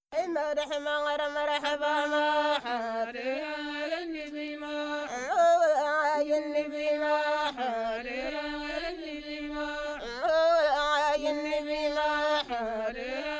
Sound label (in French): musique Touaregs; NIGER